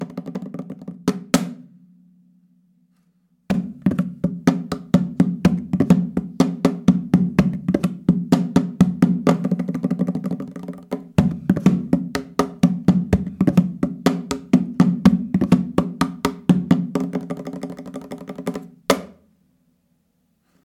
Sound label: Cajon; kaBonga